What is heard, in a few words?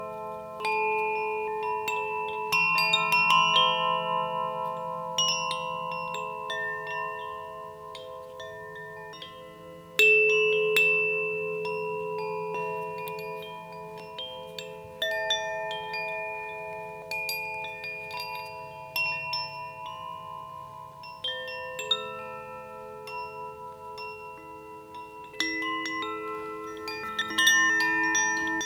Koshi Aria Mix, Klangspiel; Luft